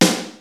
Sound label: SPD-8 KIT; Rubber Chicken Software Sample Bank; DRUMS + PERC